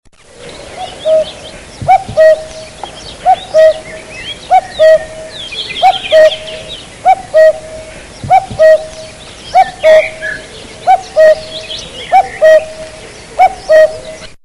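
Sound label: cuckoo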